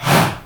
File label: HA, SHOUT, Heart of Africa